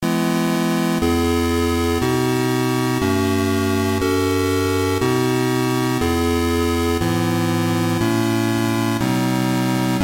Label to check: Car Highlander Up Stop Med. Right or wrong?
Wrong